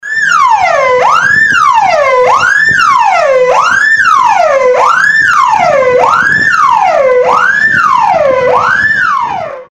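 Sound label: sirena militsii, police siren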